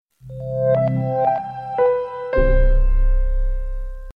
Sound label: Romance Sms